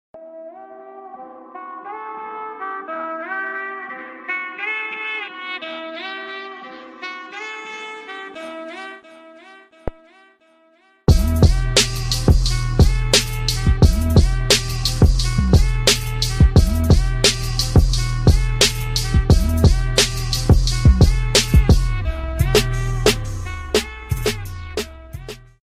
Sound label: trap